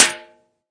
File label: Fracture Clap; ModeAudio Found Percussion Hits